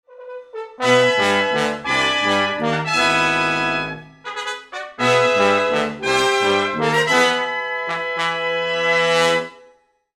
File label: brass fanfare